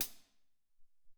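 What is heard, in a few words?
Amb Hat Closed, Alesis SR-18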